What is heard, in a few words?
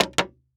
Foley Footstep Cowboy Boots Jump On Wood Boardwalk; Foley Footsteps